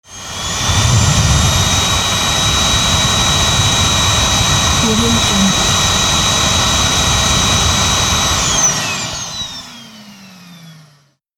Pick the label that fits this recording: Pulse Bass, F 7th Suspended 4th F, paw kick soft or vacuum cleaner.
vacuum cleaner